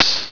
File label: morse Grounder Shoot Snd; F.A.R.M. Patrol; MacEasy Magazine CD - Issue 18